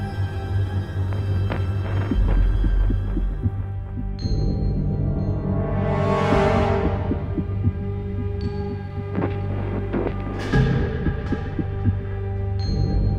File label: Space long loop; Music; SpaceshipDemo